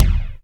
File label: Reverb Kick Snare; Percussion; Dance Synths